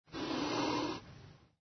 ANIMAL CAT

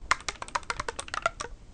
computers keyboard clicks

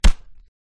punch punch

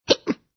HUMAN HICCUP

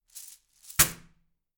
Coin Bag Set Down

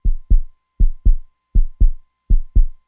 medical

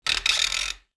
Rubber Squeak

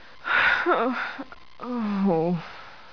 people

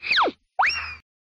slip on banana peel

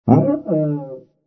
TUBA COMEDY

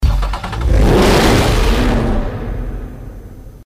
nfs hp mustang; shelby ss

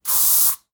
Aerosol Can Spray

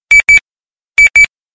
ringtones nextel reminder

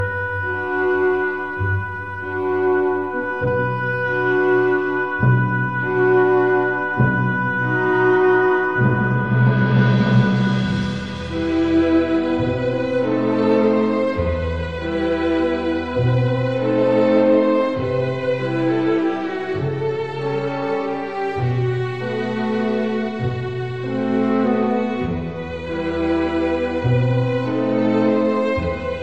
oboe

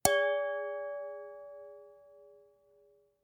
Wine Glass Clink

